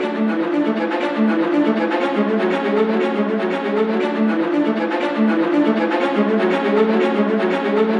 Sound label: viola; виола